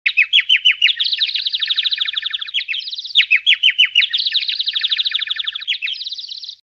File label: Dizzy Birds sfx; Battle for the Homeland